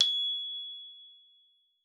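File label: glockenspiel G#5; Xsample VOL-4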